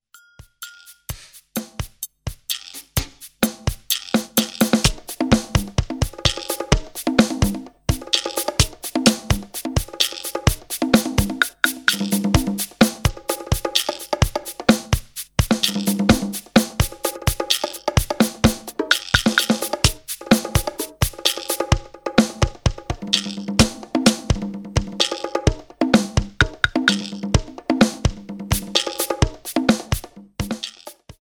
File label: metro130b